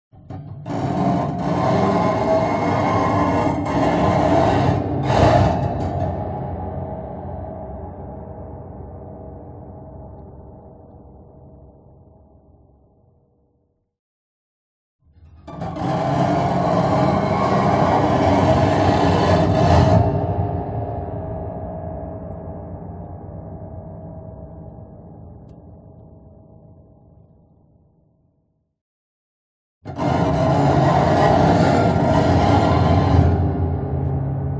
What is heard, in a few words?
HORROR Piano Bow String Broken Low Slow; CINEMATIC; smartsound